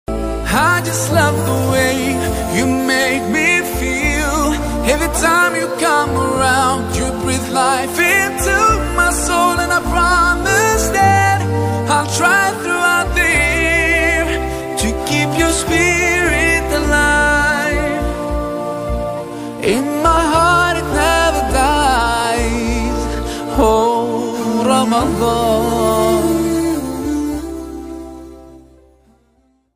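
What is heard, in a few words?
english islamic ringtone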